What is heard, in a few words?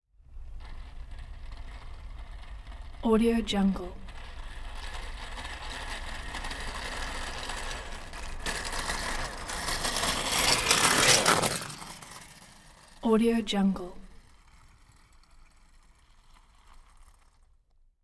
bicycle training wheels fast pass by; stingray